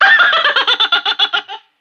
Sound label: vox laugh big